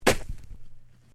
FOOTSTEPS SNEAKERS SHALLOW SNOW JUMP STEREO

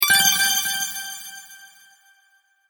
Sparkle Collect Win, video game sound effects

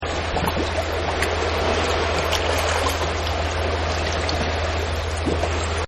Boat Harbor; Boats; Vehicles

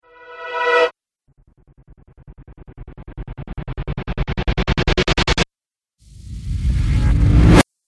Cinematic Riser Pack; Rise And Dive Sound Effects

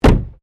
WRECK CAR SMALL DOOR CLOSE; Wrecked Cars, impacts, crashes, scrapes; Objects

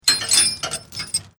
Chain Metal Clanging

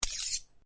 Blade Swish, Sword

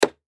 Dart Impact Hard Cork Dartboard; Games; Arcades; Sports; Blastwave

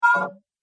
Multimedia Open Sound, beep; Business Programs, Apps And Computing Noises; Andrew Fleming

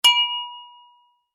household glasses wine x2 clink; Wine Glasses (X2) Clink Together; Clinks; Objects; McKinney Sound

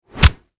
Impact Punch Body Hard; Punches and Hits; Combat

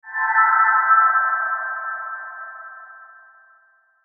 SHIMMER REVERB, Shimmer Reverb Flange; Tone; Blastwave

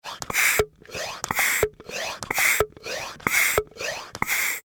Household Pesticide Pump Slow Dry; Yard Tools (Sound Effects:Tools)

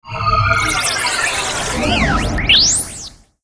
Flash Animation Electronic Descend; Electrical Distortion Hum Pan Stutter; Multimedia; Blastwave

